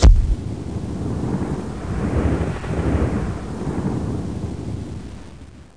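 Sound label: shockwave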